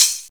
clear closed high hat sample f key, Royality free hihat sound tuned to the F note; hat samples